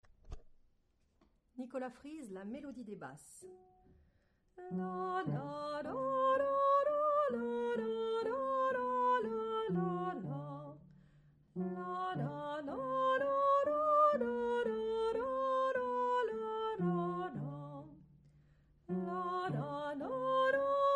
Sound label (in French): melodie Hommes